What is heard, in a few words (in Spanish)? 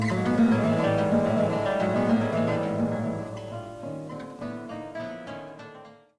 cortina duo guit; nueva cortina duo a